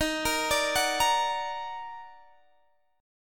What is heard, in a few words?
D sharp Minor Major 7th, Guitar Open D